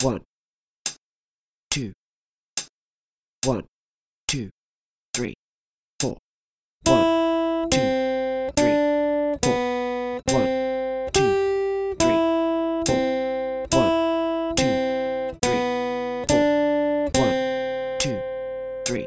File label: 2 Strings Longs, guitar